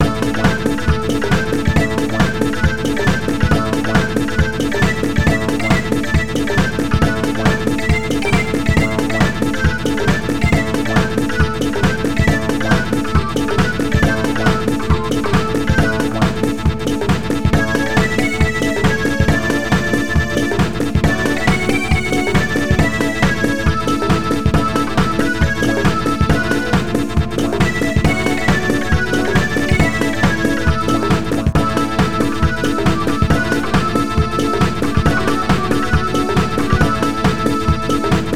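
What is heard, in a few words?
background music level; My Frogger Toy Trials; Nintendo DS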